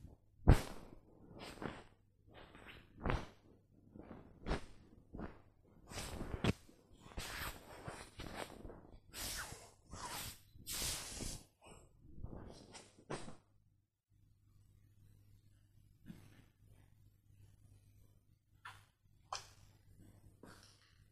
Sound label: rub the quilt; operation